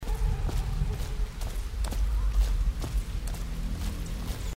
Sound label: walk stone; dishonored